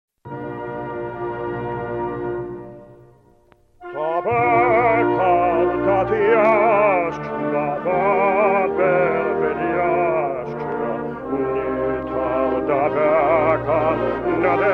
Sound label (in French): chanson hymne nationale russe